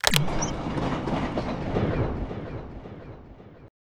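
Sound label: button off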